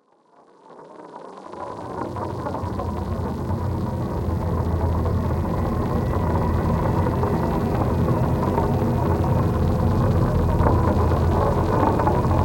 Drone Space Rain

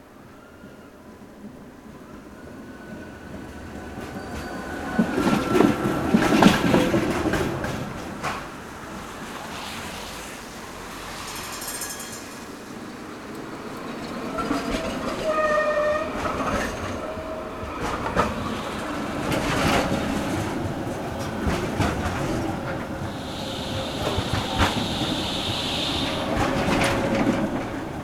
Tram Traffic Ambience Loop; Trains; The Sound Pack Tree

